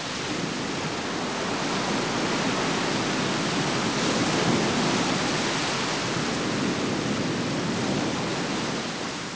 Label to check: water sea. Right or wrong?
Right